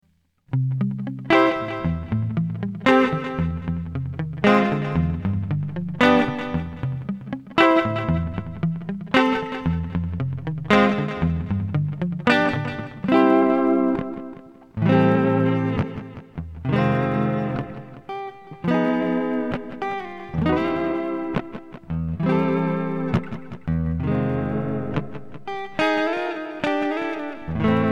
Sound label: vibrato